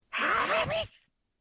ガブリ！

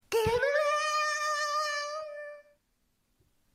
魔法のステッキ

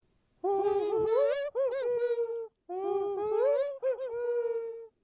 キジバトの鳴き声; 珠頸斑鳩的鳴叫; 声マネキング; 声マネキング対決; フブみこさん; ホロライブ; さくらみこ

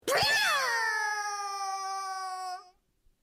ビーム砲

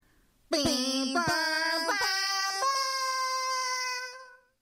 放送開始のチャイム

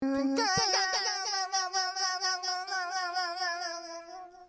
ボトルでトクトク

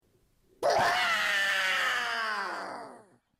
爆発; 声マネキング; 声マネキング対決; フブみこさん; ホロライブ; さくらみこ

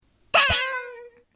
盾で防ぐ

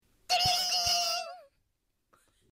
自転車のベル

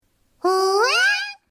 伸びる

